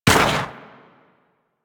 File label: Fighter Fire; Warframe; DE; Vehicles; RailJack; RailJackEnemyShips; Grineer; Space Fighter Rager Agent